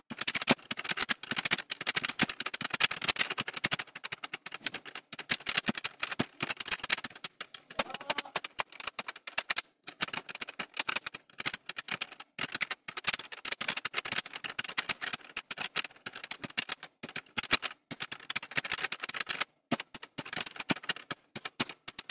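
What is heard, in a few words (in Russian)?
просто я играю на игрушечной гитаре с разряженной батарейкой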